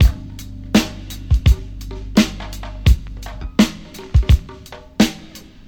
84 bpm breakbeat sample g key

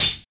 studio hi hat a key

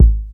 sharp kick one shot g sharp key

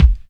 subby steel kick drum sample a sharp key

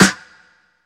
ambient steel snare drum g key; snare drum samples

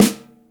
snare drum g sharp key; snare drum one shots

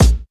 studio bass drum single hit a sharp key

